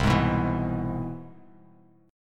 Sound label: C Augmented Major 7th; strummed; JGuitar